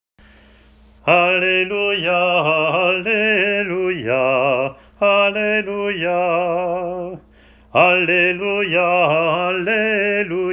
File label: hymn o filii et filiae; O filii et filiae (Easter)